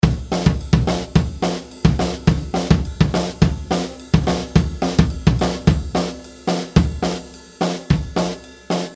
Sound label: 180bpm wannabe jungle beat